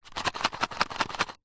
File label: office staples box heavy duty shake